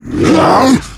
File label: death, male zombie; nmrih; zerosiki; slender